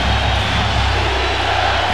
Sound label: Football Crowd Defense Loop; Crowds